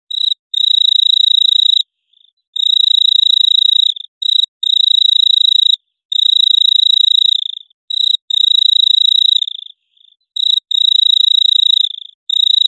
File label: a sequence of isolated, cricket chirping